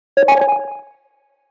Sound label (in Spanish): sound correcto